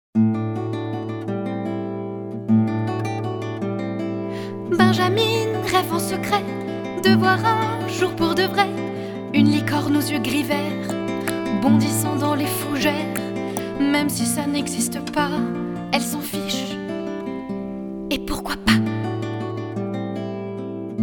comptine

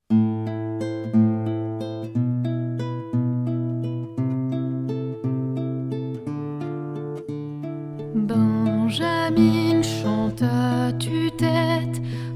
comptine

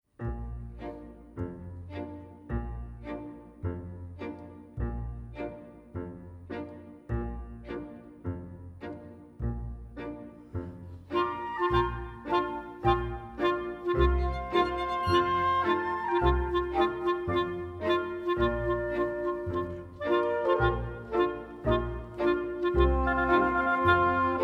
instrumental melodie

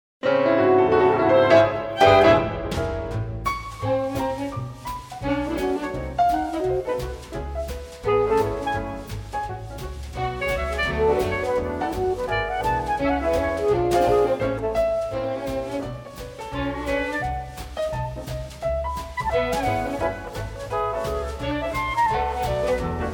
orchestre

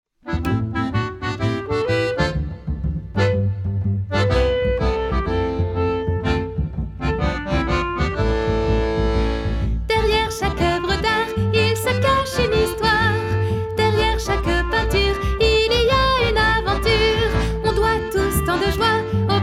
voix principale; Épilogue; Barouf au musée

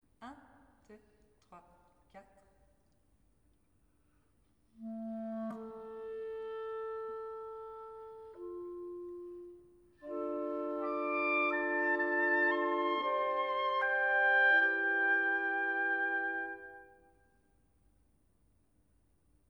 instrumental decompte

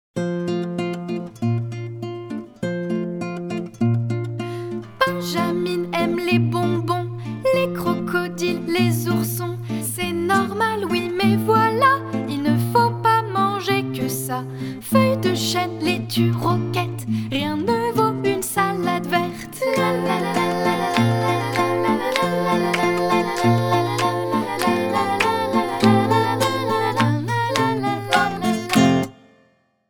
comptine

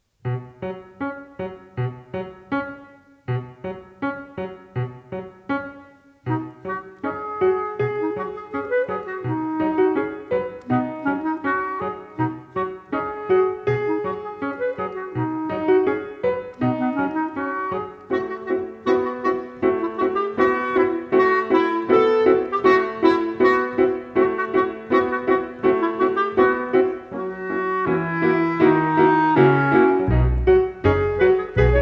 vieux poirier instrumental

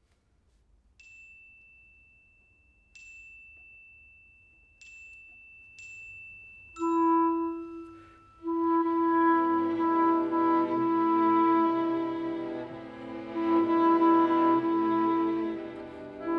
clarinette; petit prince

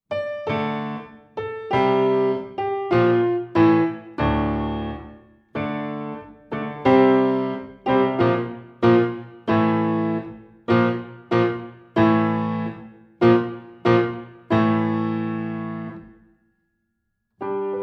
instrumentale; Treize à la douzaine

